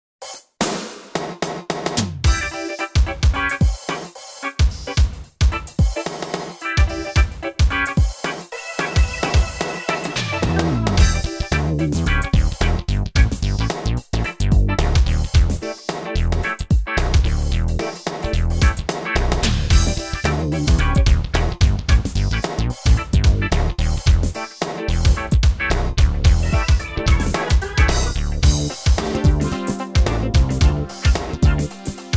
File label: funk